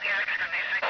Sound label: radio